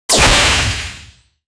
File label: fast fiery slash sound